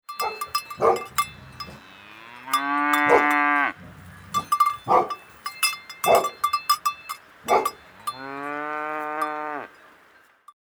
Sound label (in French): duo chien vache; loto sonore; loto des animaux